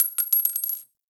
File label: AK47 Shell Casing; Warframe; Tenno